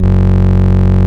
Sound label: VOX CONTINTL, F#1; Big Fish Audio - First Call Vintage Keyboards